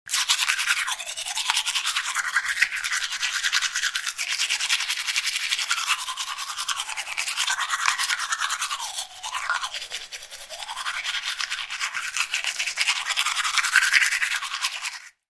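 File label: Brushing Teeth Heavy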